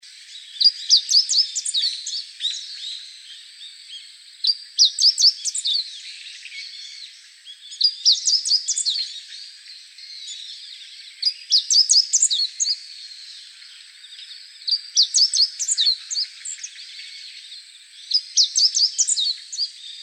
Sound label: Siberian Meadow Bunting Song